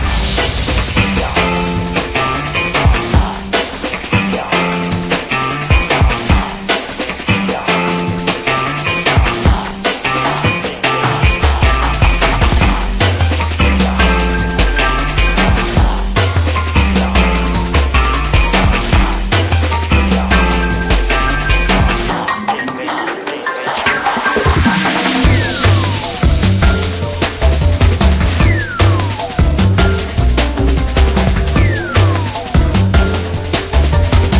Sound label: Underwater Background